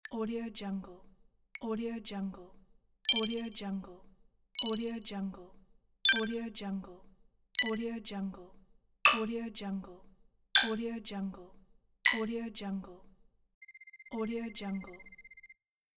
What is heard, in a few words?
game interface sounds